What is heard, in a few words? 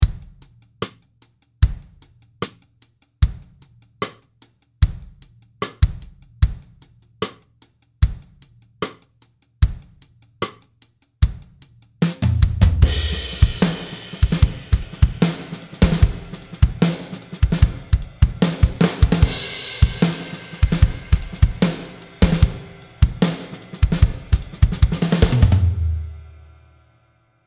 Ambience Snare Reverb; AD EOS